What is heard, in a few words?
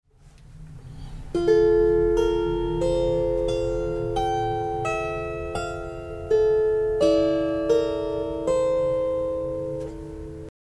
sample leier